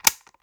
Button

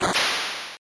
Splash

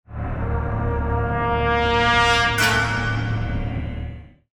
Dark Event; Lords of Xulima; LOX DarkEvent

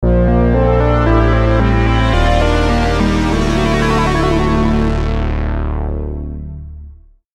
Item Fanfare; Metroid Resynthesized 2016

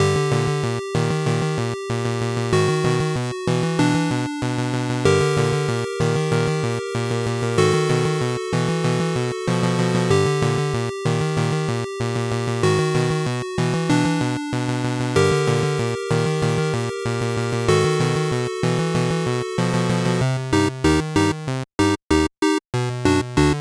Dungeon; dragon prana

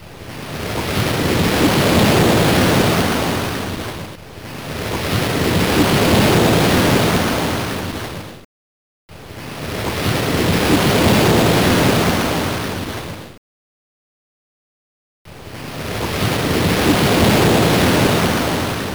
Waves Crashing; Pebble Beach Golf Links; Genesis

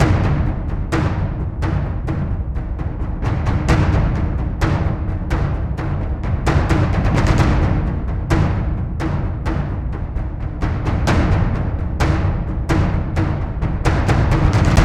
Epic Orchestral War Game Loop 130 Bpm Ebm; ps4 ps5 switch windows xbox one gamerip 2021; strike team gladius; Battlestar

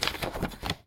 map unfold, mono; dokobots

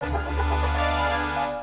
Ranking Fanfare; Scud Hammer; arcade

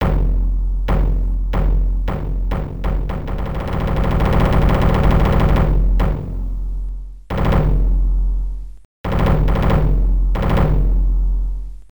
Drum Roll II; Samurai Shodown; Samurai Spirits; Neo Geo; arcade

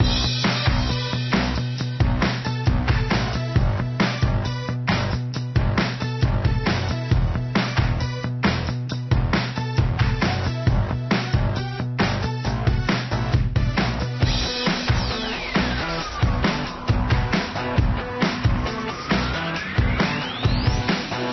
Catacombs Loop; monster high creeptastic catacomb adventures

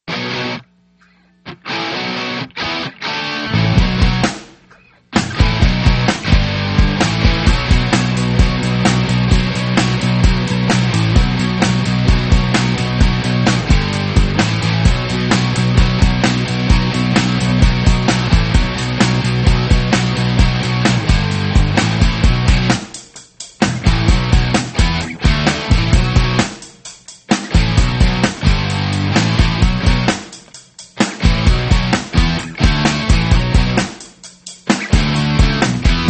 Music Rock; Touch Rock; Monster Truck Destruction; Android

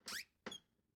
Scarf open; miside demo windows gamerip 2023

